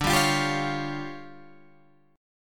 D Minor Major 7th Double Flat 5th, D, Guitar Open G